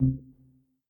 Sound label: close menu; Skillzgaming; gems odyssey 92 desktop